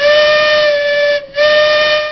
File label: lofi steam whistle; Sound FX Real Life